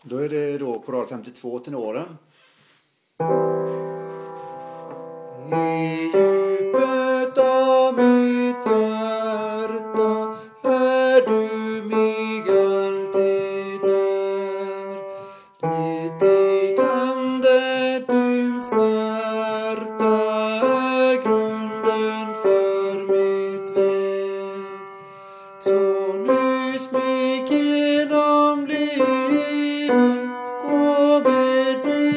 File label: choral tenor